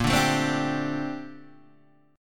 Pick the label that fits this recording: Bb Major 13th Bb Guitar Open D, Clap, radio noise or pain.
Bb Major 13th Bb Guitar Open D